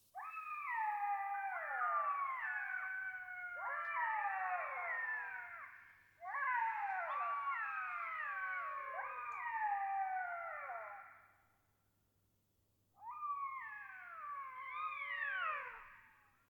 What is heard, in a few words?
Black backed Jackal